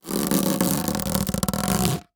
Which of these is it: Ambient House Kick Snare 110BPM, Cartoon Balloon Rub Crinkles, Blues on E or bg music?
Cartoon Balloon Rub Crinkles